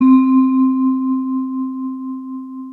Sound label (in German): Sms Glocken